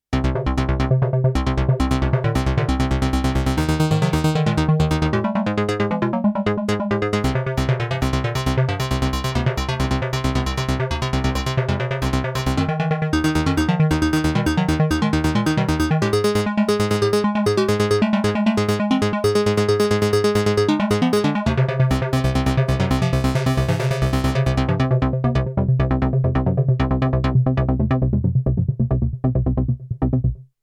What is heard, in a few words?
microfreak V4 User Wavetable